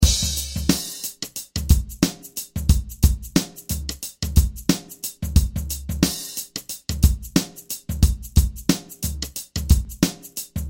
Dusky Drums

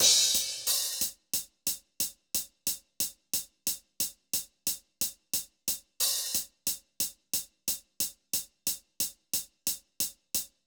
Drums - cymbals

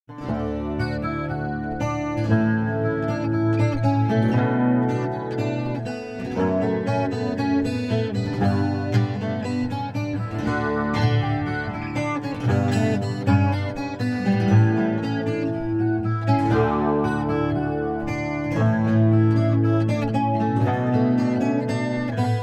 Chipped Baroque

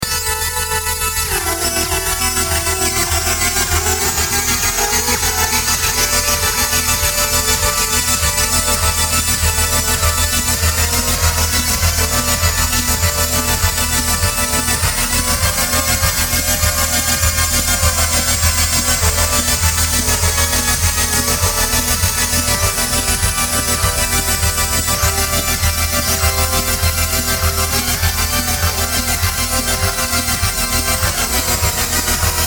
Washing Machine

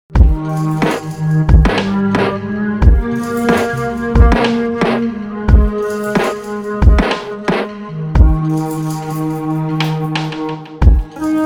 A Flute

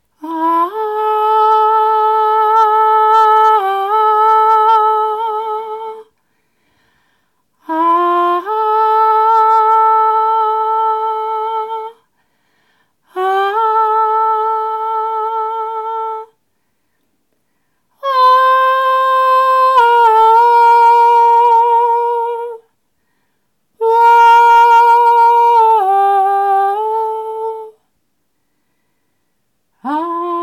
Emerging Vocals

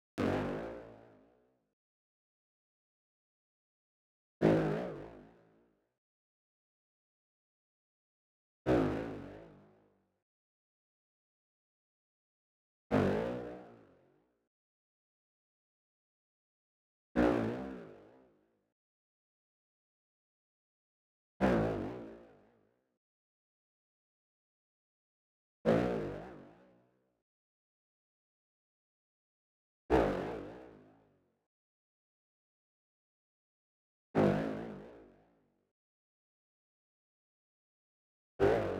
noisetar

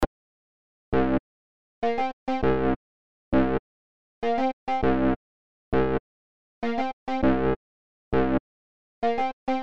e piano loops

